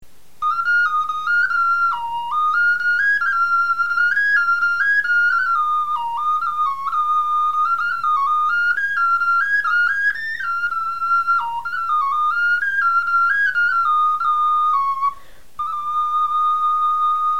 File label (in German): melodie, knochenfloeten